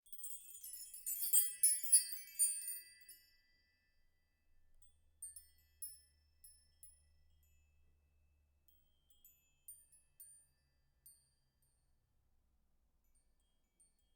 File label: wind chimes